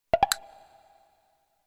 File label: UI Quirky